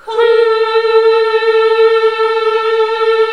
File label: HMM A#2, classic vox